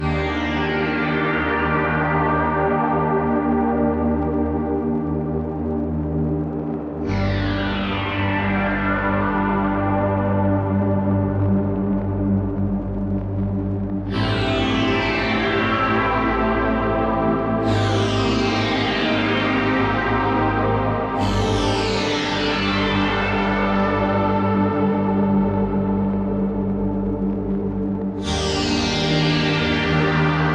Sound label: City Ruins Stem; Epitasis